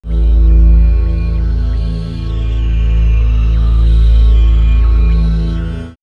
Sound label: Swirls Black Hole, pads; X-Static Goldmine